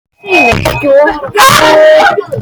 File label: ragequit